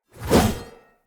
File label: Great Sword Whoosh; Weapons; Player; Mendicant Great Sword